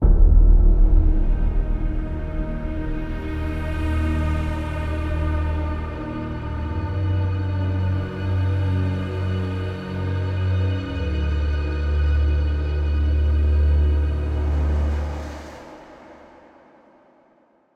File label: Combat Very Low Threat Intro